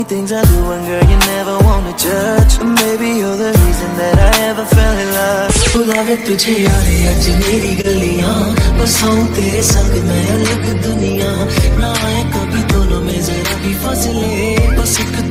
english sweet music ringtone; english ringtones

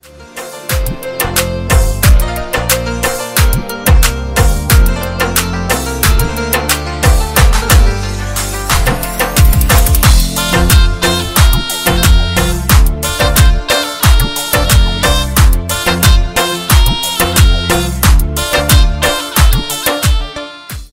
folk music ringtone; bollywood ringtones

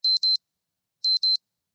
beep ringtone, sms

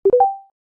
message notification sound tone; nokia

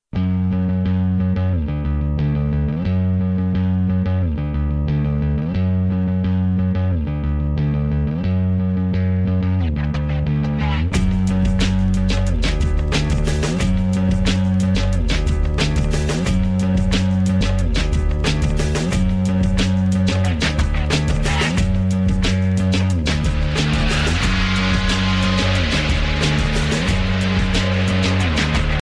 bass ringtone

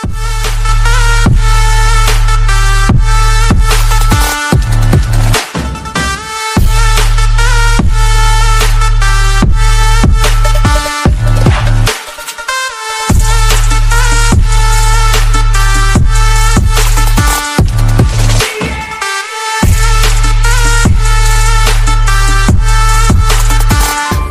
sweet cool ringtone

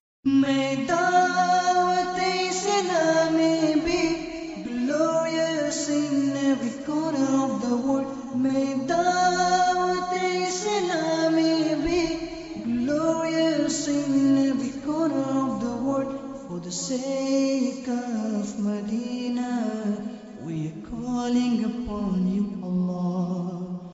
nice ringtone

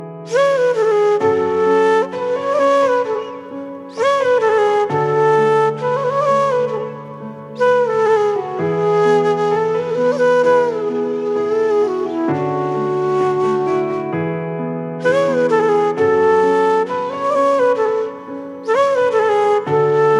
flute instrumental ringtone

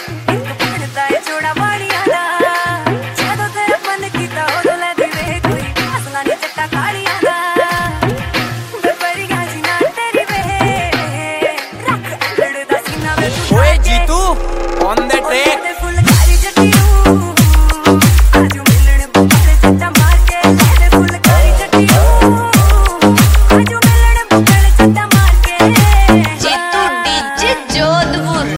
dj remix ringtone

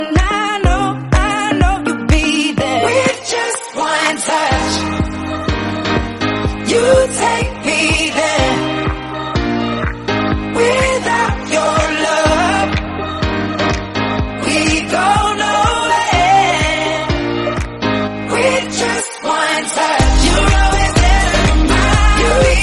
english song tone ringtone

